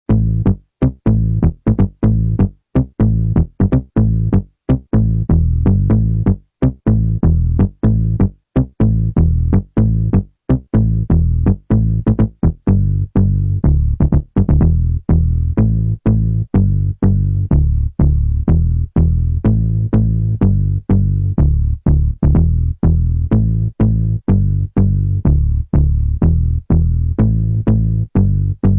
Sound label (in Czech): bass zdroj; Mirex